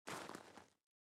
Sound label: step gravel